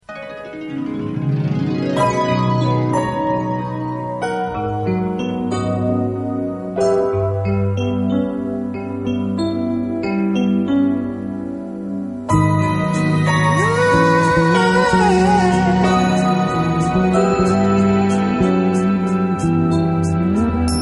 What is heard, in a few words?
Still In Love, yamaha dx7